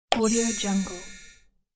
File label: percussive target sound